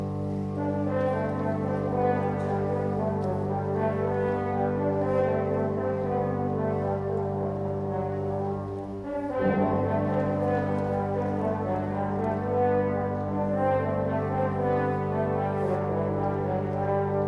Blech; Tilman Susato; Renaissance Suite; Ronde; Konzertmitschnitt vom 19. Dezember 2023

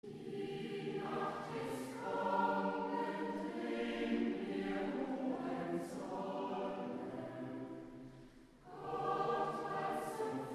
Chor und Jubilaeumschor

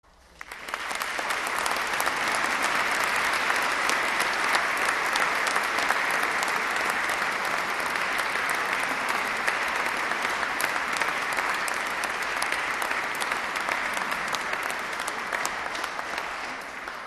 Applaus; fuer den Unterstufenchor; Konzertmitschnitt vom 20. Dezember 2016

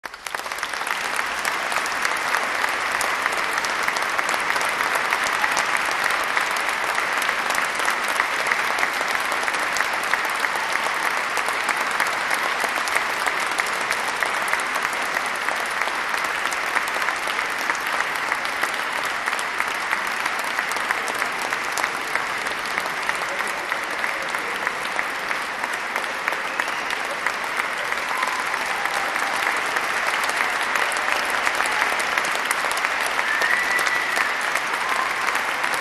Applaus fuer das Orchester; Konzertmitschnitt vom 20. Dezember 2016

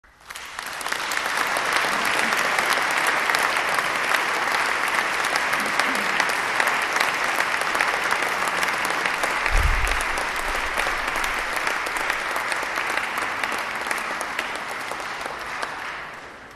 APPLAUS Chor und Jubilaeumschor

